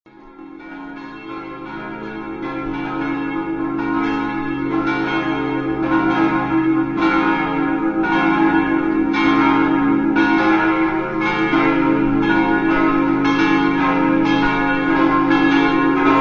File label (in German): glocken; kirchen